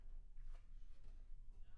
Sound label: Bass Room